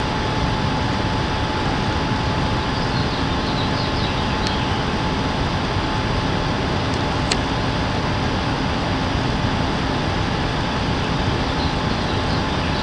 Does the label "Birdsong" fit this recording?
Yes